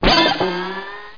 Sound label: Plop; How Many Bugs in a Box